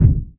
Generic Heavy Thud; Playable Tutorial Level

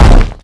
Crocgod Land; Playable Tutorial Level

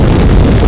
Dragon Breath; Playable Tutorial Level; TR2

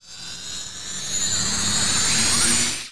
Energy Charge; Sett; Playable Tutorial Level

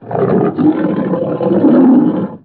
Tiger Strike; Playable Tutorial Level; TR2

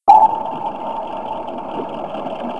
Sub Controlroom Loop; Playable Tutorial Level

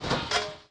Horseman Getup; Playable Tutorial Level

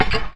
Scale; Playable Tutorial Level

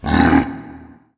Warrior Burp; Playable Tutorial Level; TR2

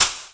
Dart Spit; Playable Tutorial Level

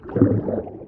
Scuba Diver Flipper; Playable Tutorial Level; TR3

